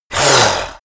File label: yeti hiss savage; kreaturen